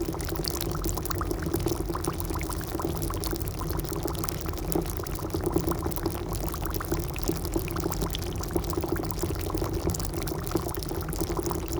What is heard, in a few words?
Dry, Ice, Bubbling, Medium, Intensity, Liquid, Loop; Mattia Cellotto; Water Volumes